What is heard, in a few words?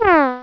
WRONG